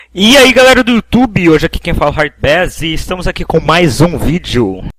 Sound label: hardbass